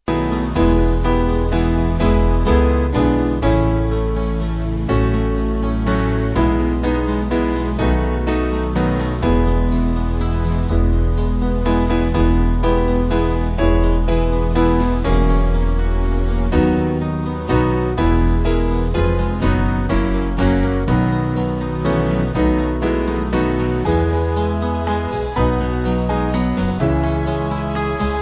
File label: O Soul Are You, Band; Lemmel